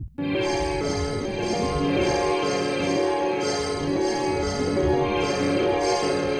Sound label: Monument Park E - Ambient